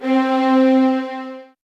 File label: SOFT VIOLINS